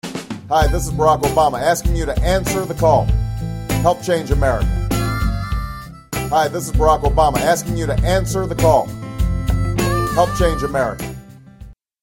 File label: ringtone